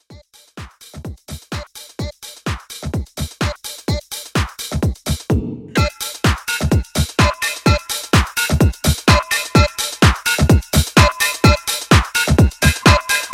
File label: Blend 127